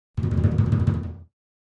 Timpani Hands Low Cre; mallets timpani

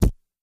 Camera flash, flash pot, deeper less explosive; cameras

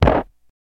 Gun Shot Bullet Impa, dirt

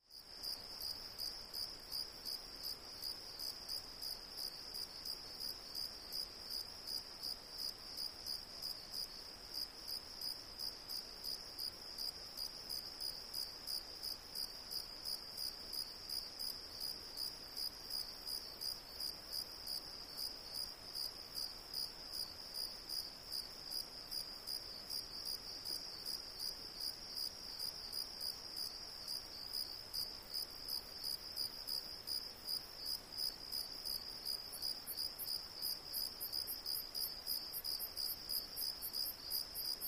Night Rural Crickets

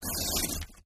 paper tear

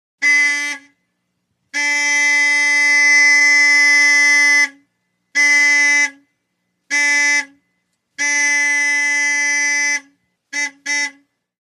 1970 Int Volks Horn

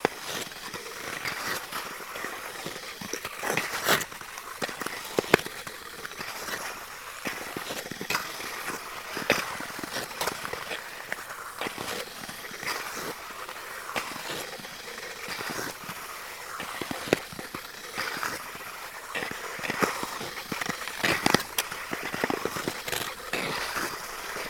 Ice Skating Cross Cou

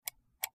Highpitched Mouse, mouse

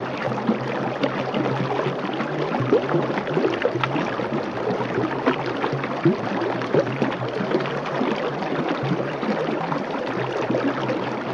Stream Gentle Babbli, streams

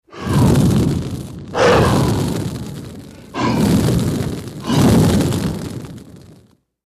Fire breathing dragon puffing fire

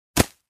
Foliage Impact Object Dropped on Ground

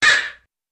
Single Car Horn Honk

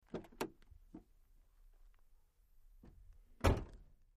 Truck Tailgate Close, dodge ram v 10

